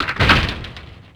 door hospital close; left4dead

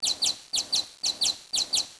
bomb nuke after idle; CSO LightZombie

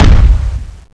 boss footstep; Zombie Scenario

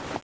draw empty, weapons; guillotine

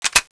draw, weapons; blockar1; cstrike

